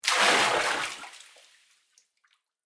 Water Pour Into Tub; Pouring; Objects; BlastWave

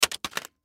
Plastic Package, Plastic Package Drop; Plastic Package Drop Cement; Foley; Blastwave

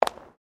FOOTSTEPS WOMENS HIGH HEELS PAVERS STEP; Footstep Foley; Footsteps (Sound Effects:Human)

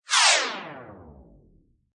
Laser Hit Descend; Scifi Production Elements; Science Fiction Sound Effects

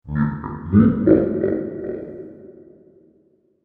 Giant Laughing Space Lizard, monster sound effect; Monster Sound Effects; science fiction sound effect

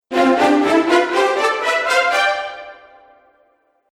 Action Film Dramatic Event, musical

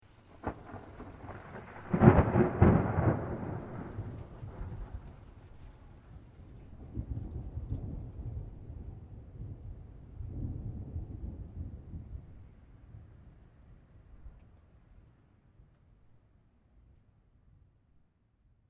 Thunder Medium Thunder Clap With Long Rumble Tail; Nicely defined thunder clap with follow-on; Weather; Thunder Sound Effects; Lynne Music

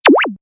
Wippa, Button sound; Multimedia; Lynne Music

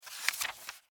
Office Book Hard Cover Page Turn Single; Paper; Offices

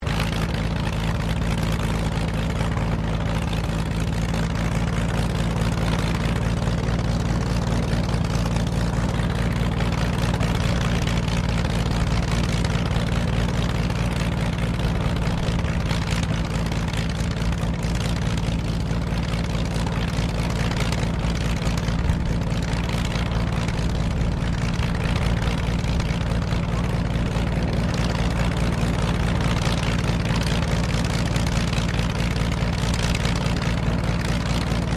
AIRCRAFT CT4A IDLE, RAAF CT4 training aircraft; Airplanes; Vehicles; SoundEffects-Foundation1

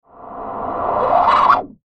Whoosh Reverse Suction Reverb Filter; Blastwave

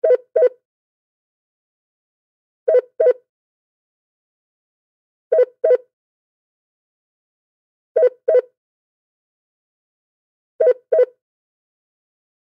Telephone Ring Loop; Telephone Ring Call Waiting Half Short Loop; Telephones; Office; Blastwave

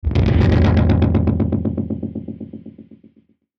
Musical Hit; Blastwave

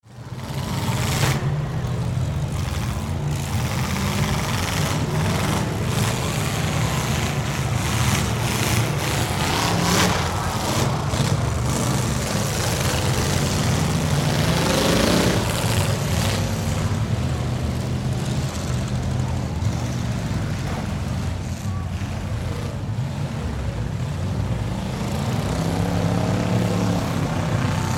Ambience Demolition Derby Sports; Construction Sound Effects; Construction (Sound Effects:Backgrounds)

